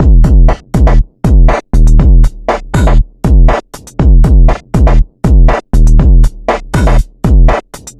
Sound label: bubble hip 120bpm